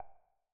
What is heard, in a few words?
mouse enter; wayvr; wlx overlay s; wgui